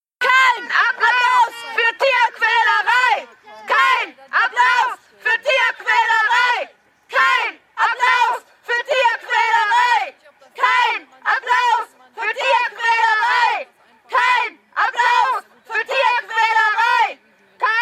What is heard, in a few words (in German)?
demonstration gegen zirkustiere; zirkus probst